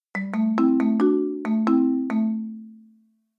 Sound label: chatter Ringtone